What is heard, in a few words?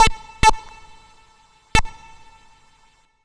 MR SYN 2 L, Roots Funk; USB Soundscan vol.41; AKAI